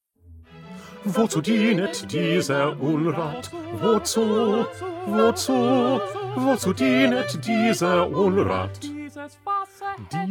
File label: Bass; Bach; St Matthew Passion